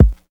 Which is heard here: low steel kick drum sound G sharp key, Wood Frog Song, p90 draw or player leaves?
low steel kick drum sound G sharp key